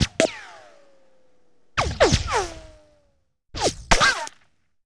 Ricochet & Whiz By Multiple

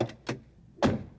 Vehicle Door Open Close; Vehicles; Doors & Keys

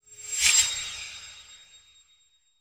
Flash Frame, Title Sequence Effects

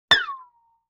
Violin Pluck Slide; Vintage Cartoon; Musical Elements

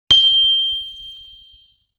LoFi Metal Chime Hit; Vintage Cartoon

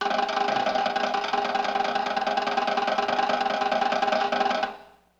LoFi Drum Roll; Vintage Cartoon; Drums & Percussion

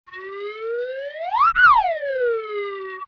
Slide Whistle Up Down; Vintage Cartoon; Musical Elements

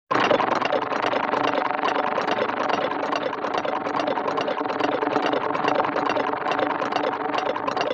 Comedy Motor, Vintage Cartoon Foley

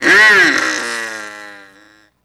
Bronx Cheer; Vintage Cartoon; Comedy Vocals

